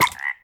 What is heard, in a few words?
frog hurt; Indev+ 0.3 Portable